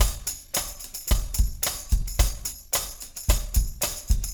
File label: 110 4 4 rim bt hh